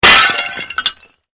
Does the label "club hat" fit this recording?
No